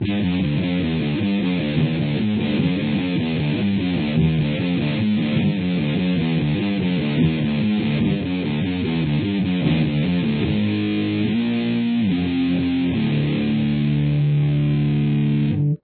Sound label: interlude